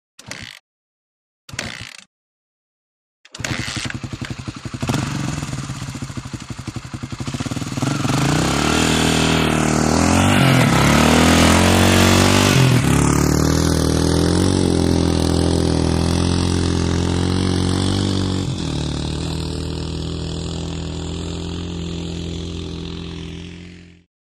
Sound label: By Four Wheeled Motor, biker group